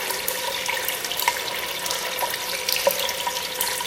water rivulet loop; ambient; canals; source engine; hl2; video games

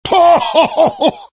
laugh short; heavy; source engine; tf

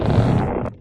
strider leg stretch; Source Engine; video game; npc

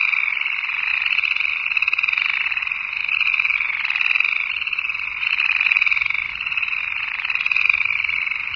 swamp frogs loop; ambient; canals; Left 4 Dead 2; Source Engine

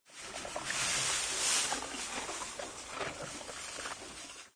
horse eat, animal; ambient; Source Engine; cstrike

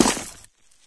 wet, footsteps

